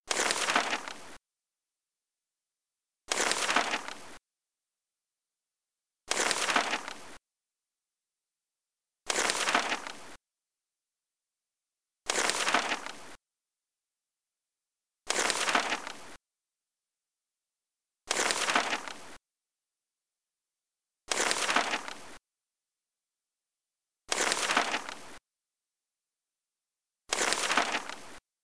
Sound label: Page Flip